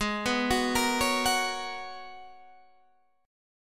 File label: Ab Minor 11th, Ab, guitar chord